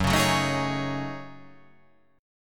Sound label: F sharp Minor 6th Add 9th; chordshape; down